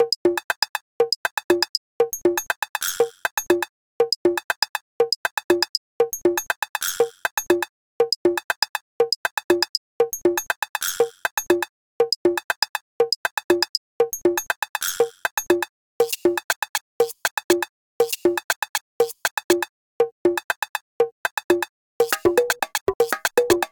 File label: beetz